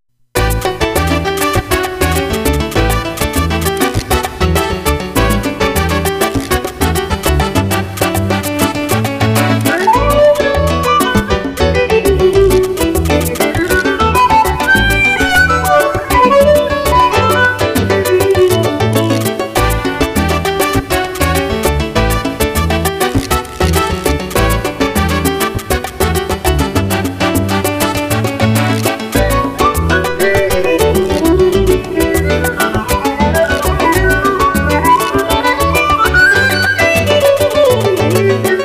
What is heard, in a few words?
Latin, violin